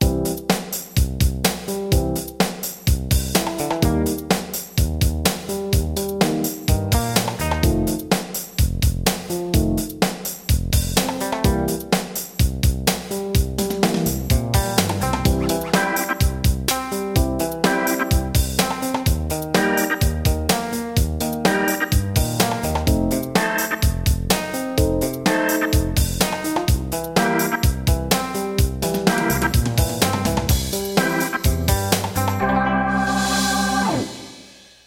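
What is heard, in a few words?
Hip Hop Soul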